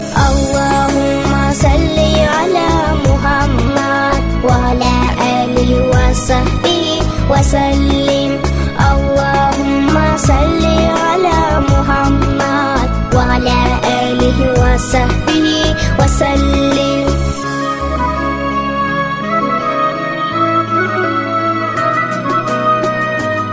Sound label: Allah Huma Salay Alla Kids; Islamic